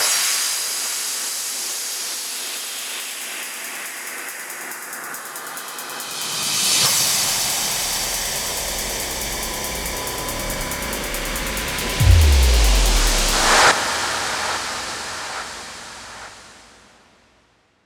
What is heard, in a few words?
140BPM 8Bars, HandsUp & Trance Fills; Frenchy; Vengeance Samples